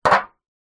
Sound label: drink can small amount liquid inside down on table